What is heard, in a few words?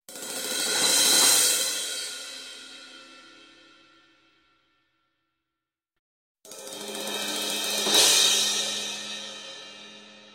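outro sounds fading out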